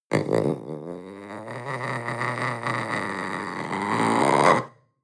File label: panther young snarl long